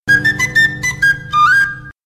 flauta; bob esponja

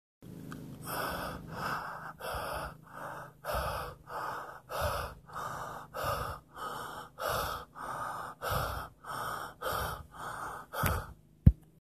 respiracao masculina; efeito sonoro

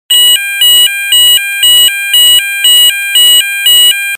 sirene cartoon

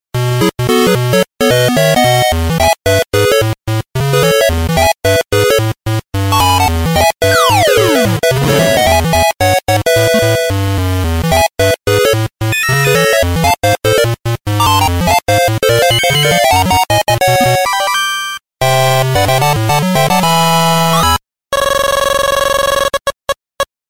video game, toque de videogame 8bits para celular

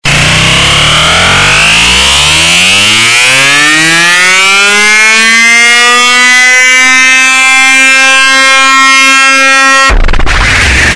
som irritante

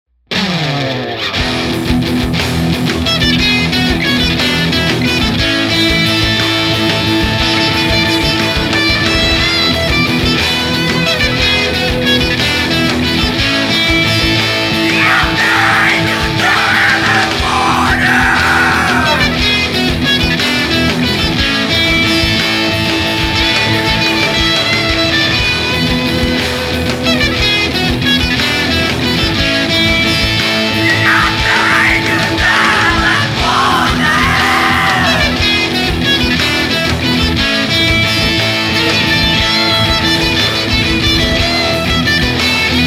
metal, toque para celular versao metal rock

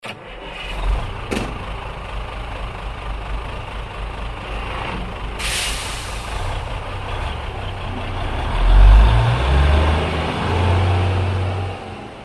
toque para celular som de motor de caminhao

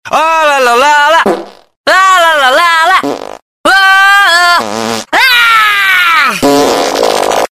lalala peido, barulho de peido lalalala

